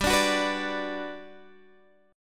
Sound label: G Major 7th, G, down, Guitar, Drop D